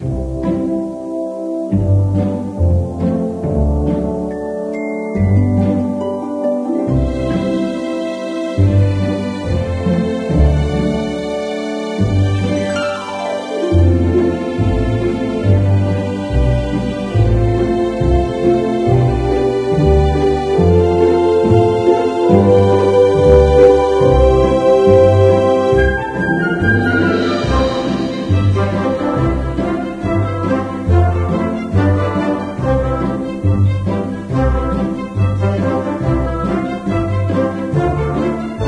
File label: music Background